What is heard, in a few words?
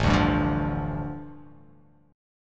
A 7th Suspended 4th Sharp 5th, A, down